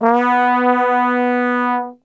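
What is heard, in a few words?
B 2 trombone glissando, Brass Super Section; Best Service; TRMB GLISS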